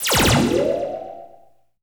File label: MELTER, LASERS STABS; ILIO; Trance Fusion